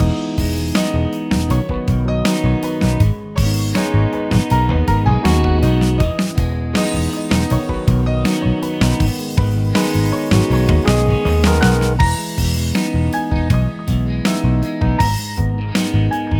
Casual Memories LOOP, Cool Chill; Casual Music Bundle v1

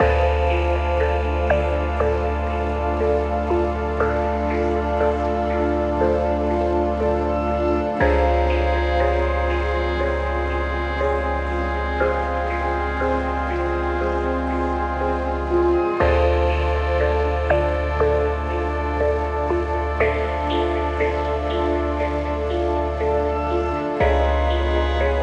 Empty Lands loop; Survival Metroidvania Vol2

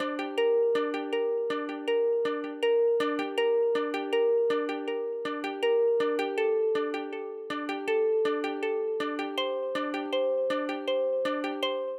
intro loop, Cinematic Battle Music

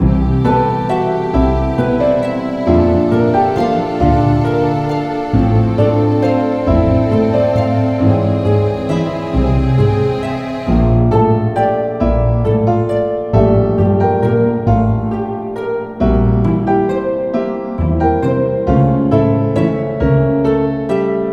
Orchestral 68 bpm; Ultimate Everything Music Bundle; 12 Loops for All Occasions; Explore the Wonders

